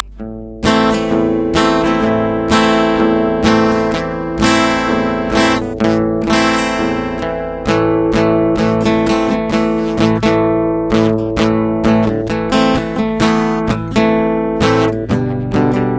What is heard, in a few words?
guitar; STALKER